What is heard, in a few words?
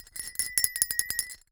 Cowbell Chain, Percussion